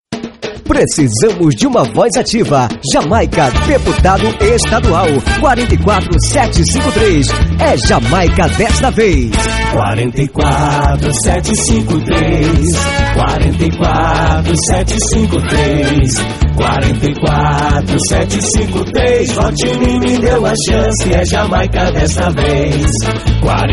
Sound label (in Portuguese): Jingle politico